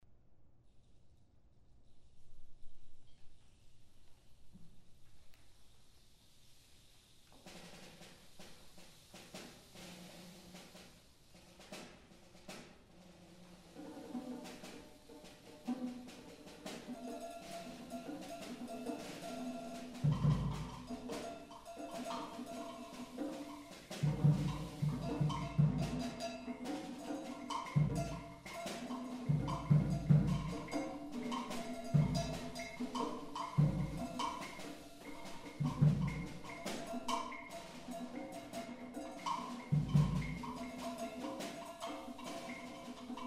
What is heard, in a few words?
Third Prelude (ensemble)